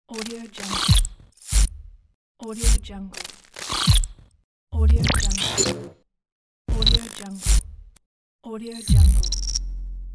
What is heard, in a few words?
glitches; enessound